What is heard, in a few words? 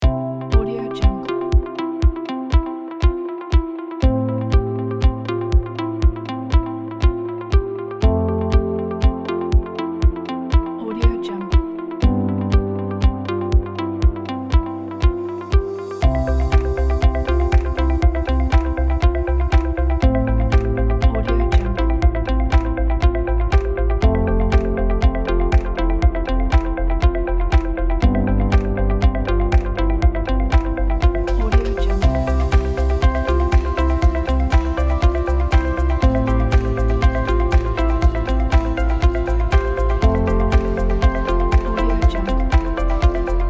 corporate promo